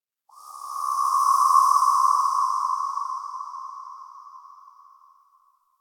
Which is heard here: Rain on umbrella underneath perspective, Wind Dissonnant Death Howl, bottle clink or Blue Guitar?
Wind Dissonnant Death Howl